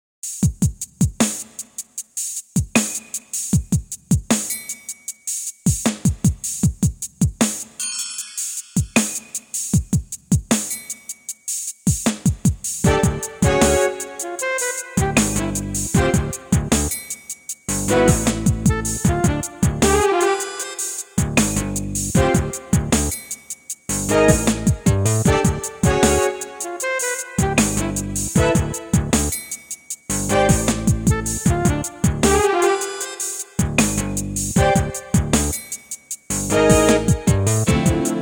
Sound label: Brass Song